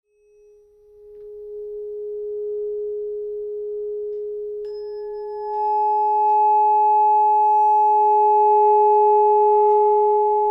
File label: Solfeggio Frequency Massage